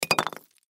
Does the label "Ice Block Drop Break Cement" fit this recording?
Yes